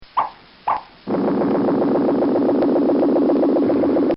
Pops And Electronic Pulse; Objects

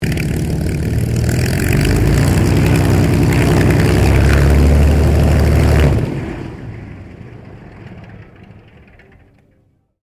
AIRCRAFT SUPERMARINE SPITFIRE MK-VIII SHUTDOWN; Airplanes; Vehicles; Airplane Sound Effects

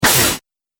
Droid Flyby Shot, robot; Robot Sound Effects

